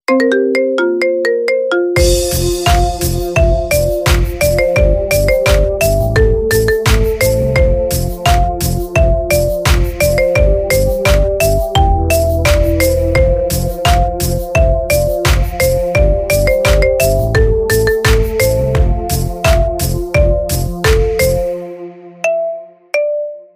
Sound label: marimba remix